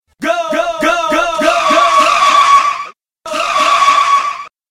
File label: Racing Drift